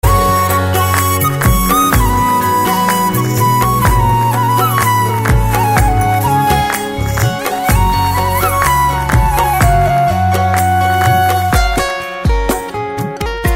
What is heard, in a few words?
Flute malayalam ring